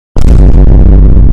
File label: vine boom bass boost